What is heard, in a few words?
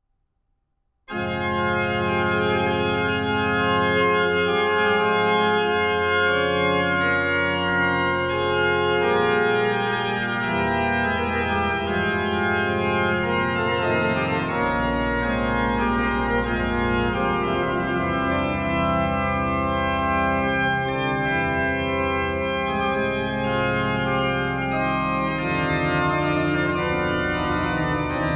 Magnificat Plein Jeu, organ; Dandrieu; ebersmunster